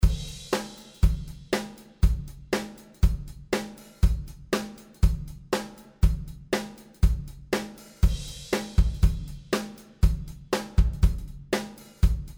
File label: Drums Dry